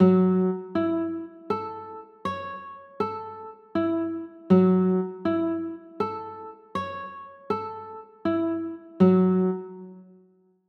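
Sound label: G flat m9 chord, guitar; g flat m7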